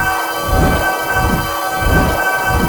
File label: trinket lens loop; LoL; items